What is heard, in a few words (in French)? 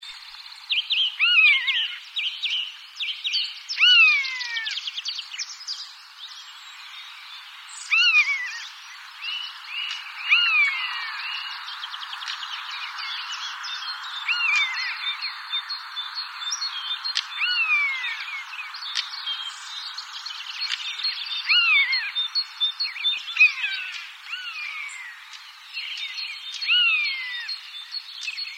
Buse variable